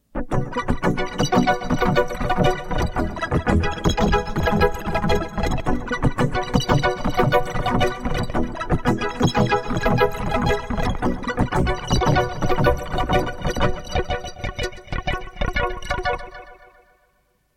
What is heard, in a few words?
Yamaha SY77; 1989